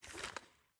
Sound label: weapon holster; weapons